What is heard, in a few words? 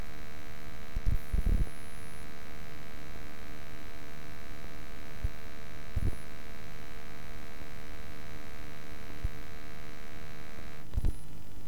winter benq monitor, telephone pickup